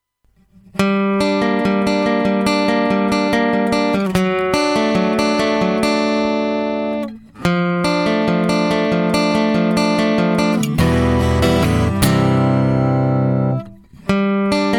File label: Acoustic Guitar; Black Lion